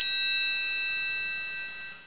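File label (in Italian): triangolo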